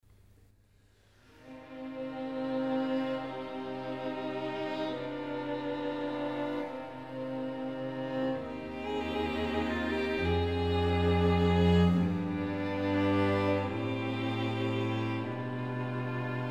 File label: Quartet in C, String Quartet; Haydn; Op. 54